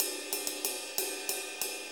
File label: 6-8 Ride, HiHat & Ride Loops; Sonic Foundry (Sony Creative Software) - Loops & Samples Drum Tools